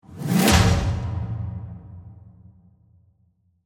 Stinger Reverse, Stinger, Reverse, To, Hit; Production Element, Imaging Element, Accent, Transition; Stinger Sound Effects; BlastWave

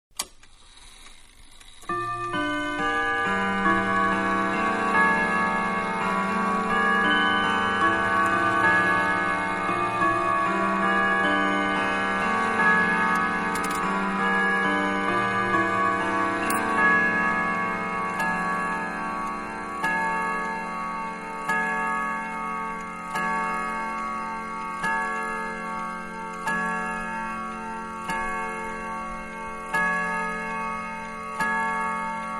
Grandfather Clock 1st Tune; Machines; Clock Grandfather-1St Tune; Clocks

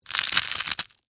Crunch Ramen Noodles; Texture; Crunch Sound Effects; Objects; Blast Wave

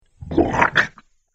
Arcade Game Slime Squelch; Video Game Sound Effects; Retro Inspired Sound Effects - Think Nintendo, Atari, C64, Etc.; Andrew Fleming

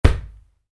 Soccer Ball: Kick Ball; Football kick; Sports; Stuart Duffield

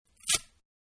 Paper Torn Short; Paper-Being Torn-Short; Paper (Sound Effects:Materials); Manhattan Production Music

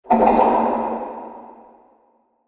Reverberating Noise Haunted; Ghost Sound Effects

